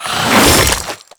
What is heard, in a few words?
Whoosh, Weapons; Infested; Coda Pathocyst